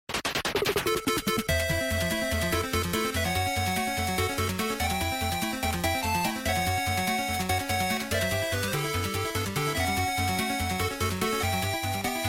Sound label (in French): videogame generique